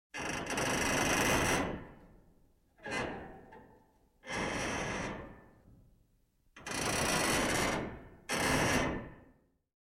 creak metal; METALL; KNARR

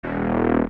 Zap, TV

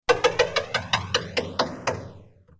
lotto wheel, NÖJESFÄLT LOTTERIHJUL

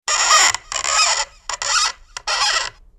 cartoon squeek; TECKNAT

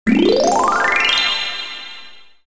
cartoon chime; TECKNAT

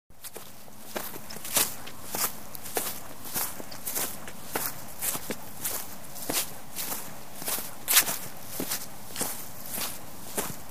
steps grass; FOTSTEG; UTOMHUS

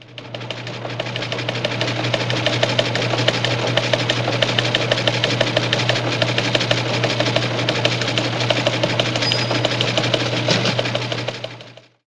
TELEX

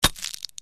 bulb, KAMERA BLIXTLJUD

